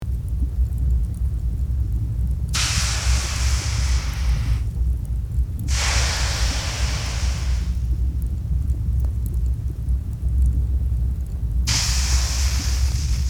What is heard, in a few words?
Volcano fumarole ambience steam hiss; nature